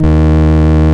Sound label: VOX CONTINTL, Eb2; Big Fish Audio - First Call Vintage Keyboards